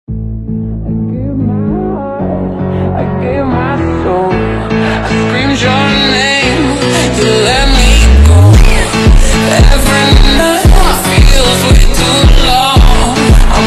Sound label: funk super slowed reverb